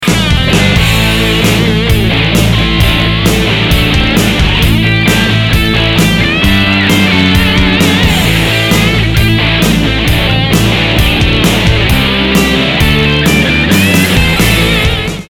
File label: rock